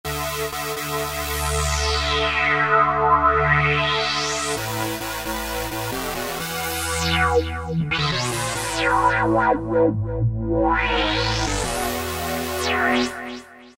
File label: Ring Chord, Korg microKORG; Factory Voices